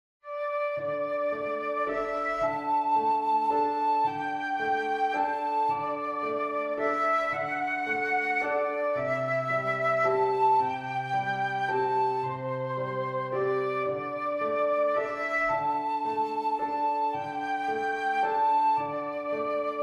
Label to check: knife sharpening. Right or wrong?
Wrong